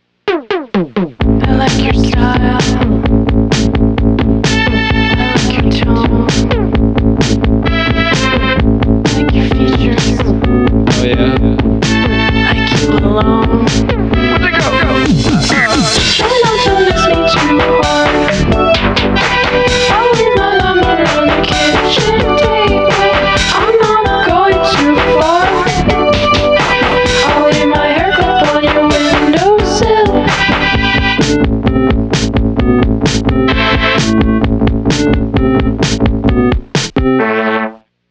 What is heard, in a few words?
Sine